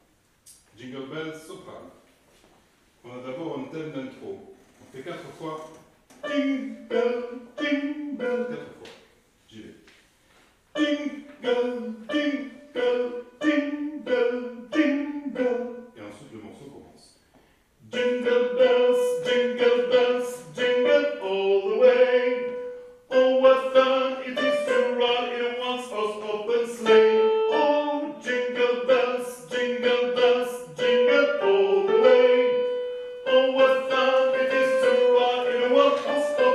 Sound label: Jingle Bells Soprani